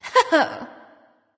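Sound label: "heh heh", nonverbal; smite; voicepack; khepri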